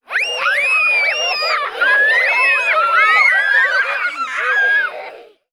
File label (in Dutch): spelende kinderen